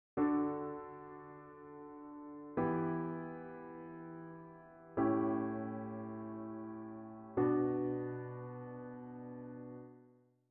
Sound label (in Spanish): cadencia